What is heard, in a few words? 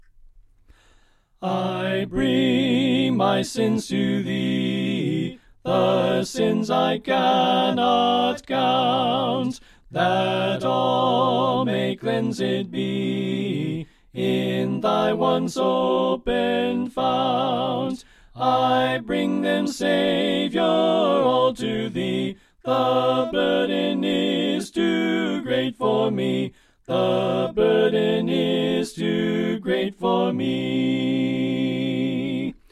Soprano Left